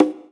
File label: Percussion, House